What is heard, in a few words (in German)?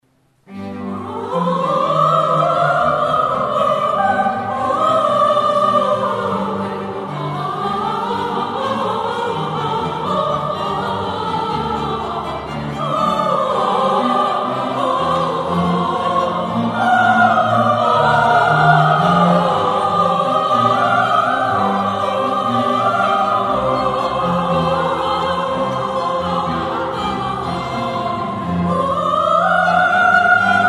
Amen Chor; Scarlatti